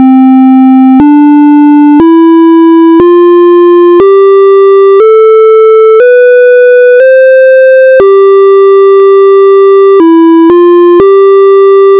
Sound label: piano